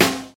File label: natural jazz snare c key